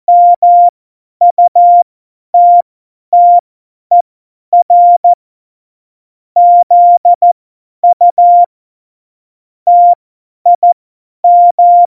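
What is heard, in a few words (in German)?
Witz, cw morsen; Morsekurs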